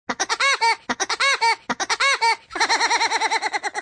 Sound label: woodpecker laugh; woody woodpecker